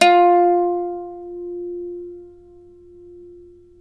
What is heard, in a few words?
f+3, Mandolin; Terkelsen